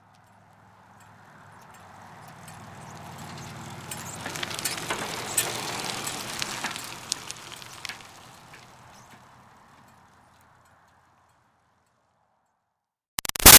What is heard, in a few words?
Bicycle Retro Cruiser By Slow Pedaling; Vehicles; Bicycles